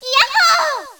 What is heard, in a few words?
Boot; Tengai; arcade